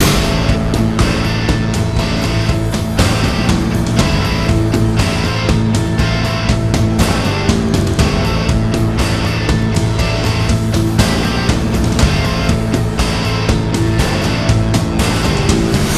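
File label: heavy guitar; ACTIVATE TRYP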